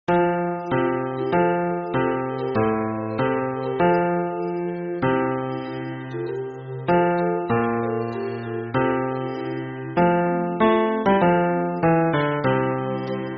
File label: hymn bass